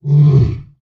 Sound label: Lion Roar Single Call; big cats